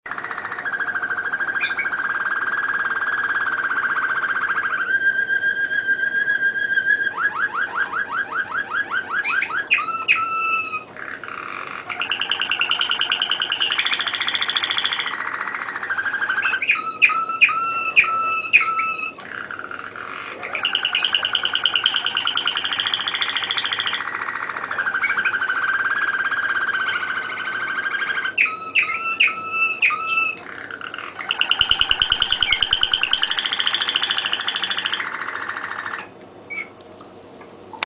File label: canary sound